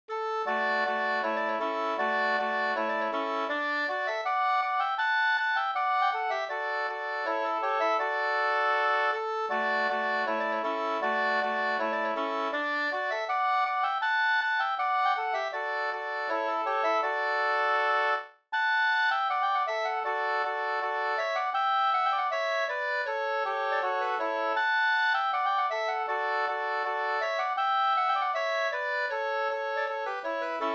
skanklat; Mora aldre brudlat Da

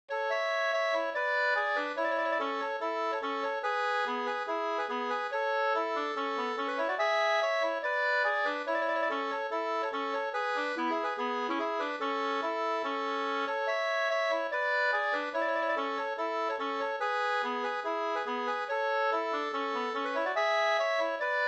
reinlender

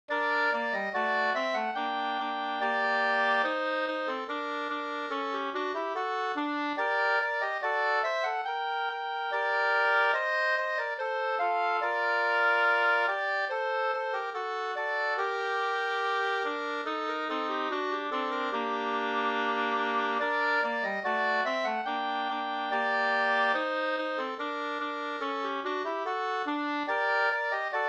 ganglat; Kyrkmarsch

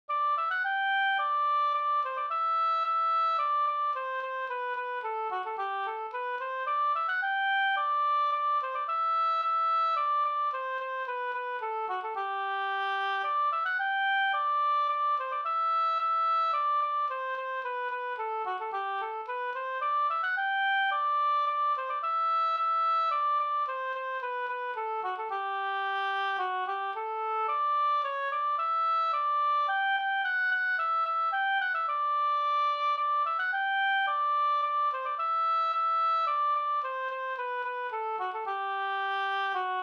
slangpolska

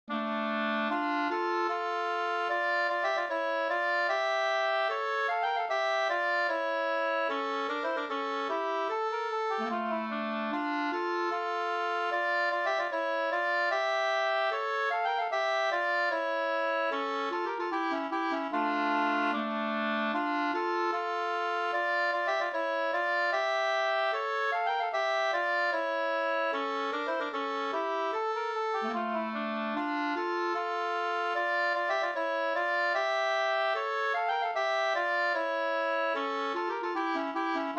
vals brudvals

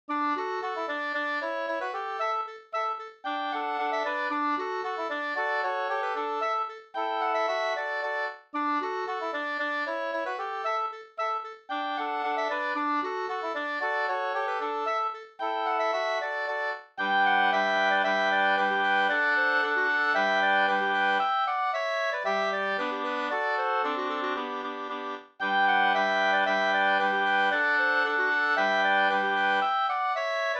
polka, 2st

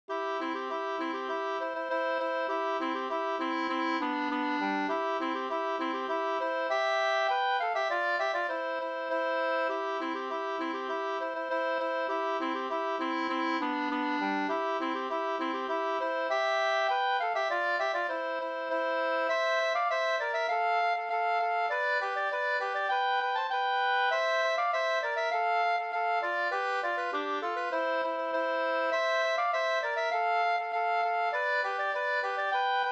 engelska; e Per Eriksson e Carl Erik Hartman

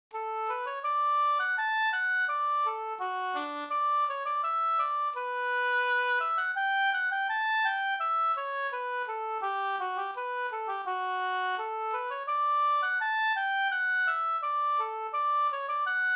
brudmarsch; av Bosse Johansson; Matfors

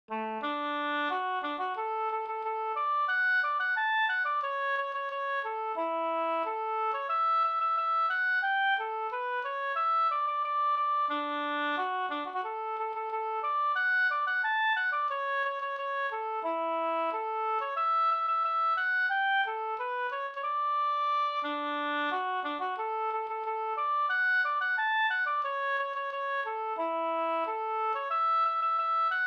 ganglat

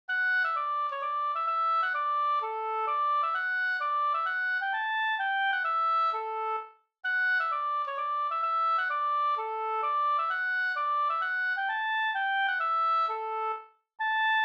polska

